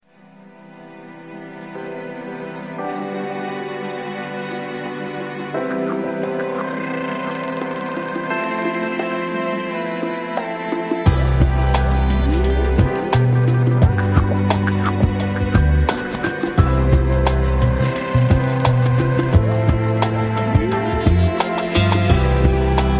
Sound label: Ambient Beats